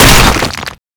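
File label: impact stone pillar close; Explosions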